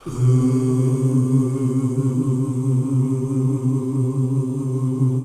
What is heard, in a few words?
OOH C2, GOSPEL; Best Service; Hallelujah; GOSPEL 10